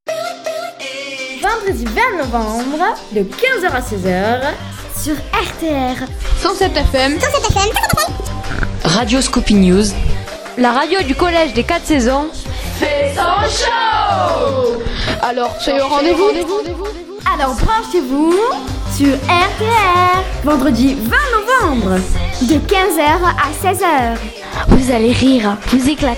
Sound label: jingle